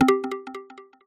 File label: ping airdrop